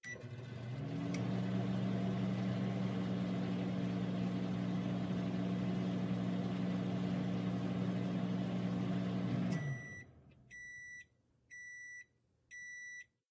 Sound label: microwave; minecraft